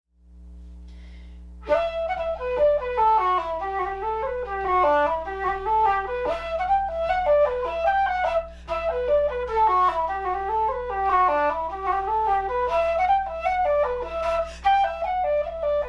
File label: SOLO flute